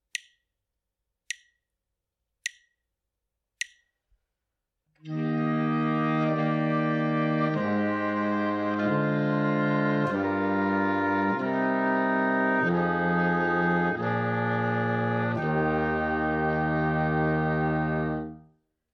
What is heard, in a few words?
harmonic dictation